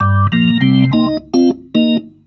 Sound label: B3 LOOP C; B3 PLAYED